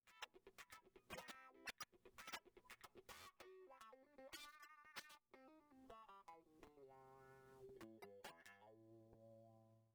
budda budwah, effector, wah

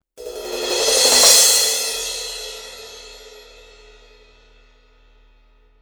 sabian aa medium thin16

